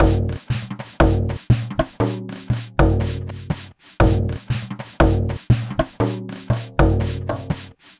Frame Drum Loop, Ethnic Instruments; Sonic Foundry (Sony Creative Software); The Electronic Music Manuscript Collection

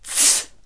NOISE, CLASSIC VOX; Best Service; Hallelujah

